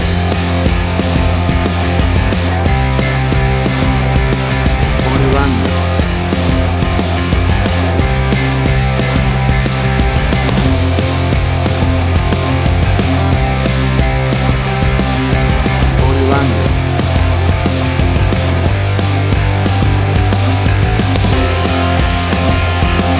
A Basket Case of Punk Rock

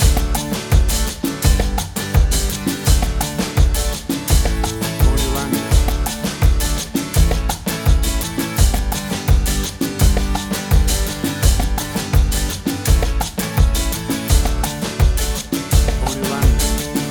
Calypso Reggae Fusion

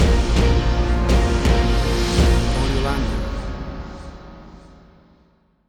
Big Hollywood Action Movie Music Stinger

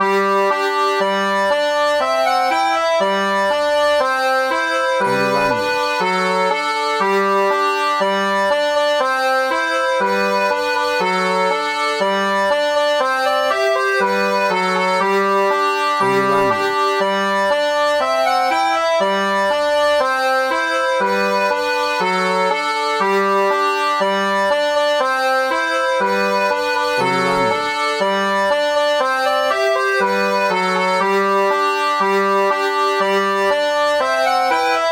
Accordian; Baa Baa Black Sheep

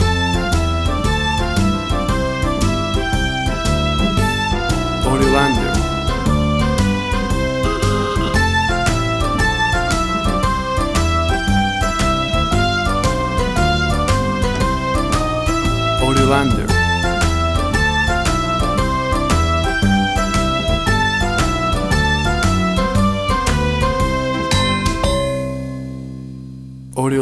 Bouncy and Fun Music for Kids

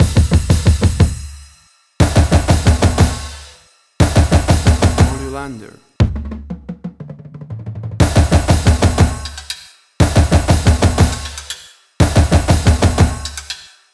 Drumcore; Level 1

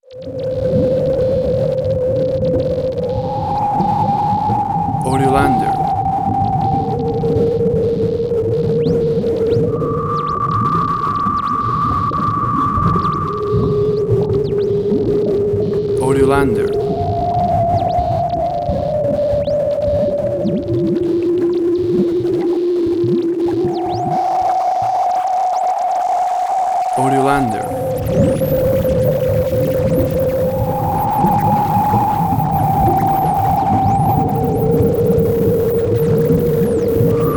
electronic aquatic ambient

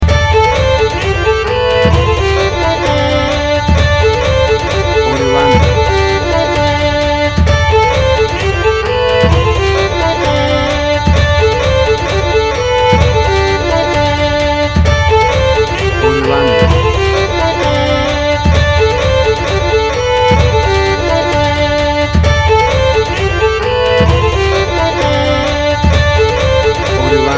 A Celtic Nation

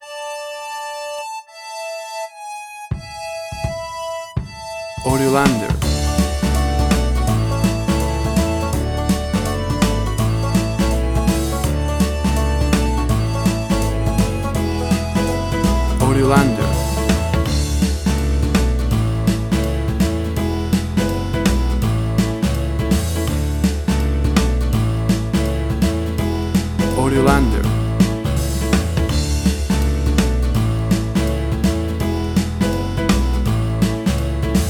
60s Country Barndance

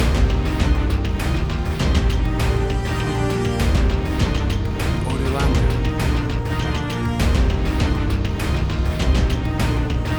Big Powerful Action Movie Score

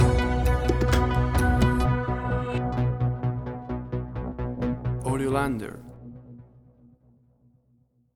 Atmospheric Synth Tension Stinger

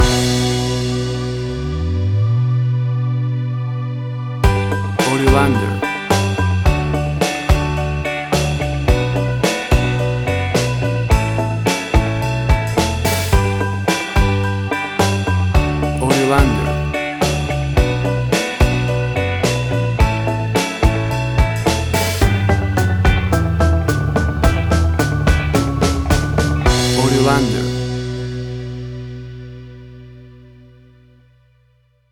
Slow Dance in the 50s Prom

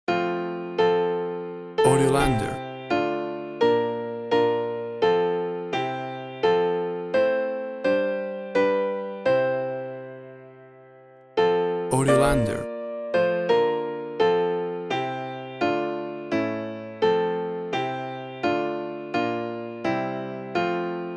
While Sheperds Watched Classical Piano

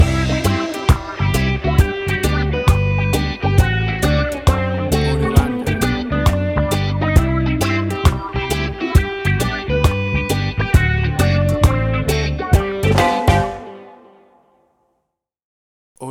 Cute Happy Reggae Summer Music

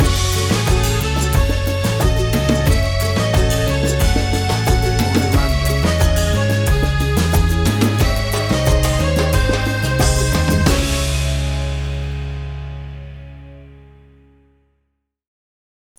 Calypso Reggae Fusion Groove; 15 SEC

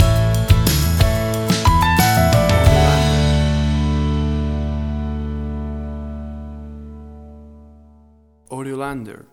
Acoustic Guitar Stinger; Acoustic Guitar Lovers

